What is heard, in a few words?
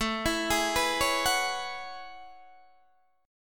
A Major 13th, Guitar DADGAD